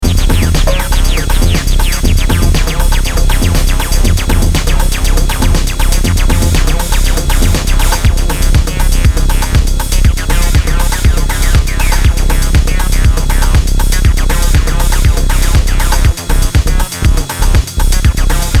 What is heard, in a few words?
Body Techno